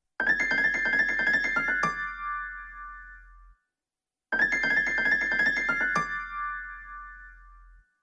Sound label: iPhone Blues Alarm Ringtone